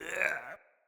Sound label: Grabs Acorn Vocals Male; Bromius; Wazard; Envoy